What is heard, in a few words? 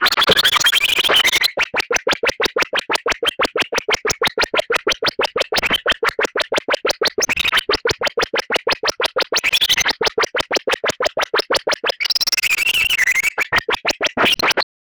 warble alien creature; quirky